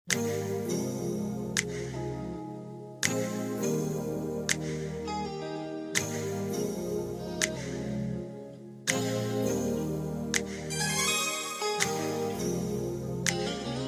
(Ab) No Backing Vocals

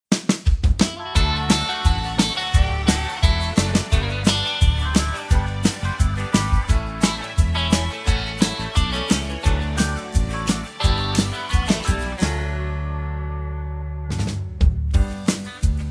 (D To Eb) No Backing Vocals

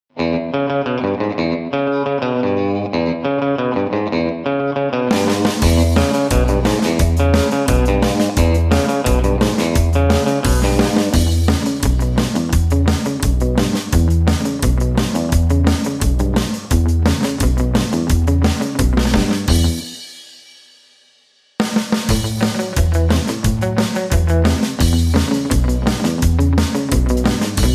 E, backing tracks

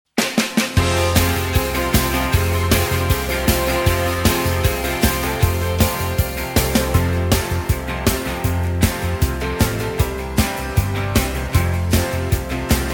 F No Backing Vocals, backing tracks

